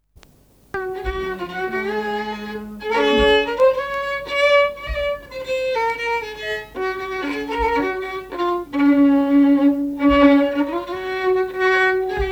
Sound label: Polska; efter Petter Masen